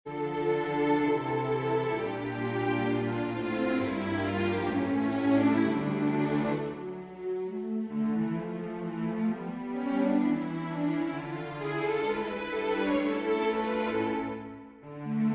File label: chorale for string quartet; bach johann sebastian; jesu meine freude